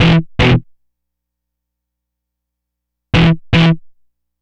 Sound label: Disto Guitar, Guitar Synths; Dr. Fink's Funk Factory